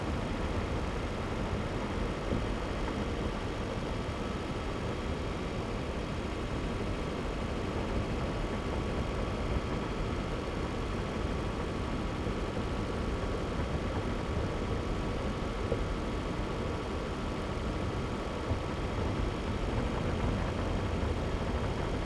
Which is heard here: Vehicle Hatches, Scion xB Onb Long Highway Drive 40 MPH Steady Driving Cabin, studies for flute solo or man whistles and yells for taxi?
Scion xB Onb Long Highway Drive 40 MPH Steady Driving Cabin